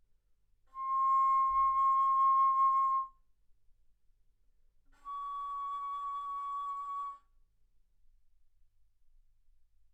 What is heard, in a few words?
Bass Flute C6 Db6; ff